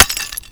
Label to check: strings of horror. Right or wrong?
Wrong